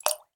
water drip; sound effects one

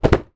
leisure game box down on surface; zapsplat

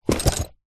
sport body builder belt set down

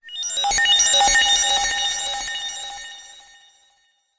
cartoon robot beeps delaye reverb comical

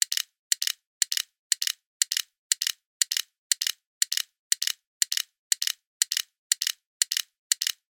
sport pedometer clicks walking

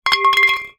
impact metal small pipe drop hard ground; zapsplat

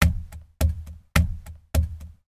steering wheel tap person rhythmic

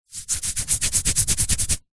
human itch skin; zapsplat

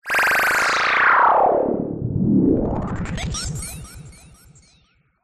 sound design sweep electronic stutter rate slow; zapsplat

